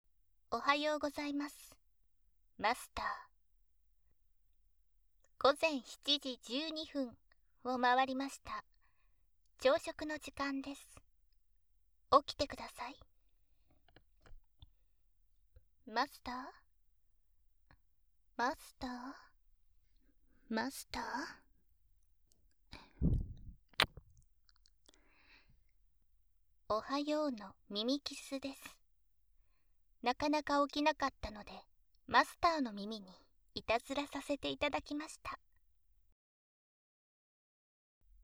耳かき, ASMR; マスターの耳はニーナのもの

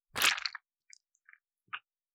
射精音

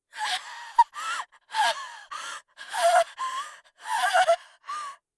震えた過呼吸; 効果音なし

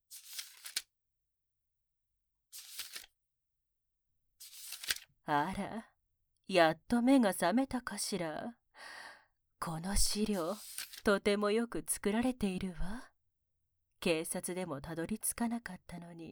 耳舐め 尋問 乳首舐め; ラーガの正体; 69 口内射精 ごっくん; 快楽悪魔

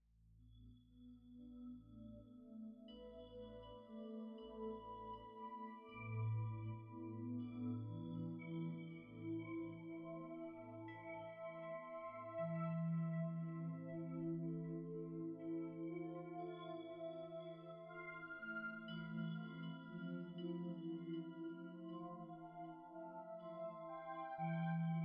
ヒーリング BGM; 立体音響版

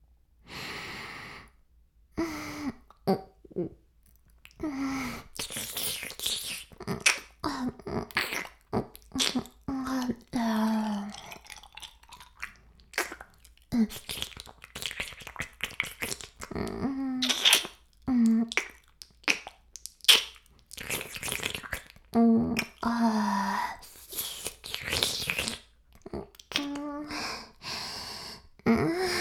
耳舐め音声 (LR逆ver), ASMR; 白くて黒い魔性円環

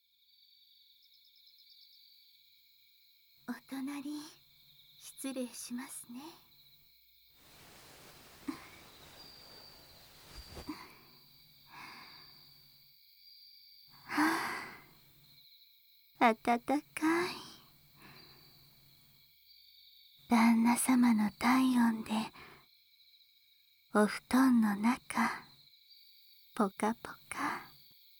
耳なめと手で

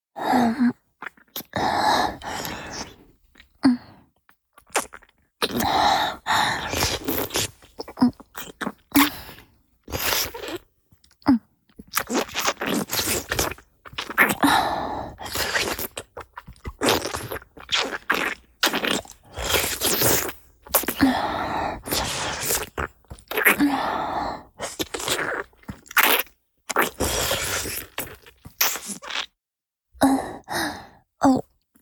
キス(中):舌を絡めたり歯や歯茎を舐めあうディープキス; チュパ音抜粋集

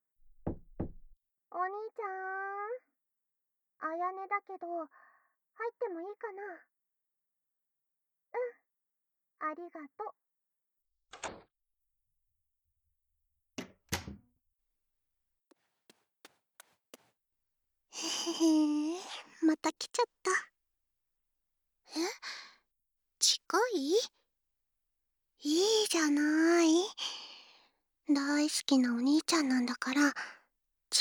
お礼 の 耳かき

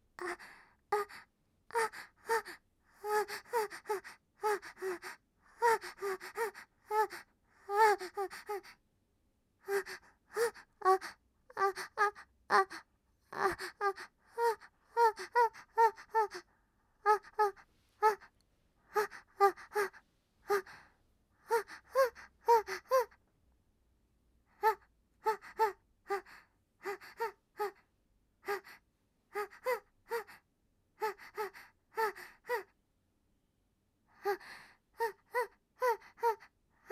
バイノーラル あ、あ、あ、あ、

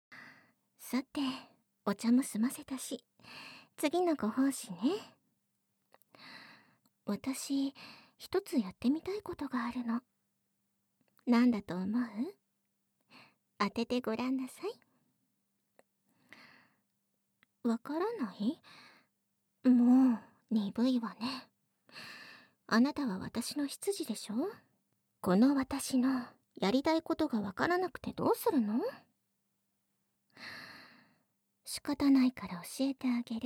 お嬢様の耳かき; 玲香お嬢様の甘々いちゃラブご奉仕【耳かき・耳舐め・濃厚エッチ】; オナらぼ

